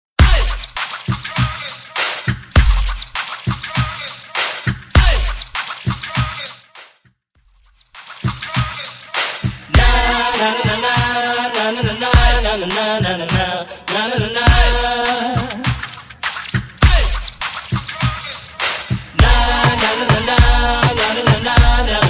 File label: 90s Old School Dancehall RMX Bootleg; Mainstream VIP